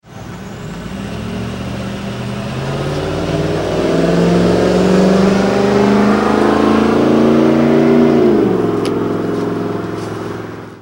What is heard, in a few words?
SHO takeoff